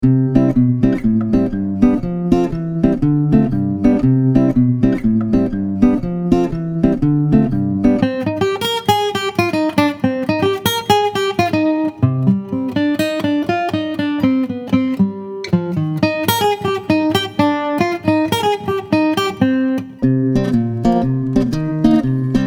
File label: noodling